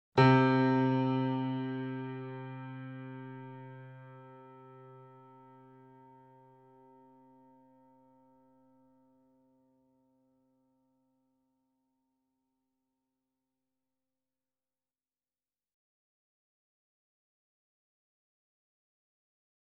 C3 E3 A3 Grand Piano & Effects; KORG; Sound Sampling Collection VOL-3; Grand Piano 88

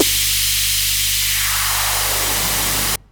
Hiss Hit; Zero-G Sound Sense Grime Scene; 90 s Sample CDs

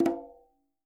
quinto; Sampleheads; NYC Percussionworks

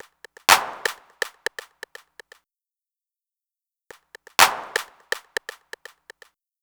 Stick Clap; Electronic Point Blank

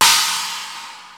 TR, drums perc, Roland; Rubber Chicken Software Sample Bank

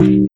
Metals Kick

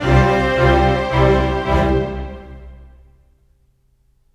Rock Pop Strings, Orchestral; Sonic Foundry (Sony Creative Software); Orchestral Series VOL-4 - Rock and Pop

